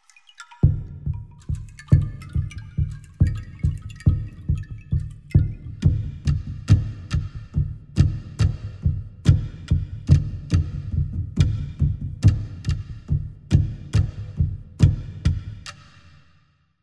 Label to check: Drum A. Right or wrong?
Right